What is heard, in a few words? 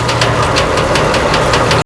large door slide; Max Payne; cold steel